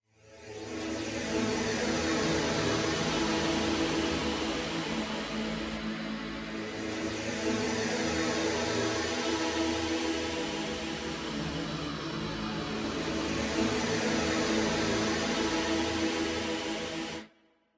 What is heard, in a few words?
Spectral Choir; Syntheway